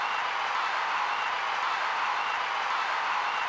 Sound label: Harvest TV